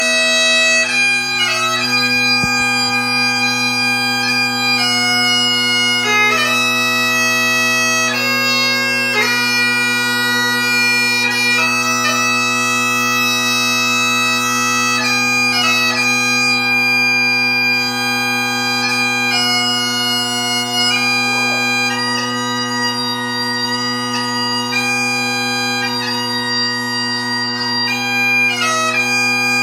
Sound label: Bagpipe